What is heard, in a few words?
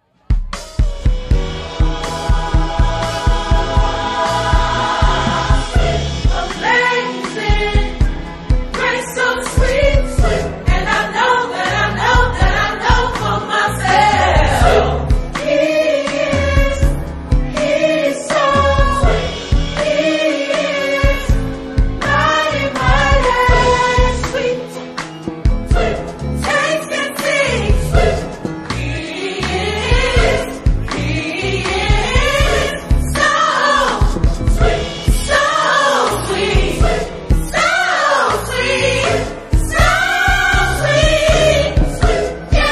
Choir Sweet Grace